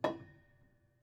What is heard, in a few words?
Prepared Piano